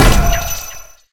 stun; smite; ahpuch skin10

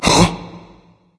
confused; smite; susano

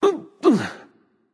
nv grunt lift; cernunnos

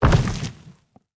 footstep; smite; geb

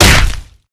melee impact; smite; vamana

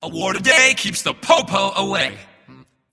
ward placed; smite; voicepack; janus

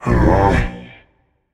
confused, voicepack; smite; jorm

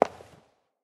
footstep; Smite; Baron Samedi

